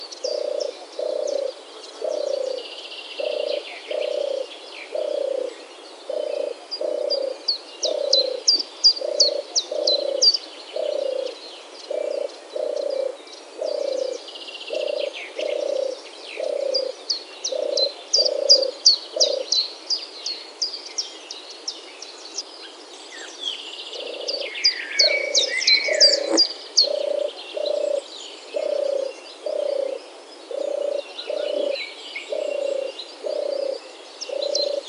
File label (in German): Turteltaube; Ornithologie